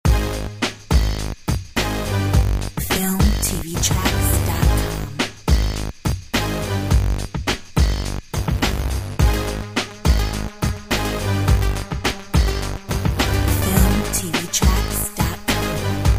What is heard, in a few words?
Groovy dog